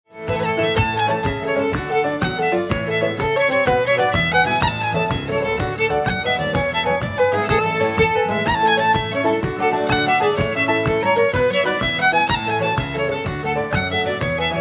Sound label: jig; juniper